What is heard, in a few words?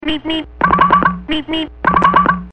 meep meep